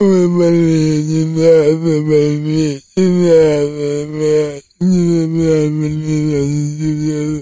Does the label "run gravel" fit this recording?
No